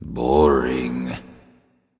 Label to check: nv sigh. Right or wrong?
Right